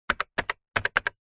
foley cash register electronic buttons press sequence; zapsplat